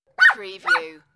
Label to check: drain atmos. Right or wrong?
Wrong